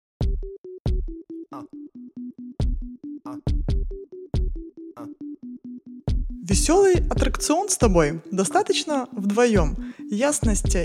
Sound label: LAUGH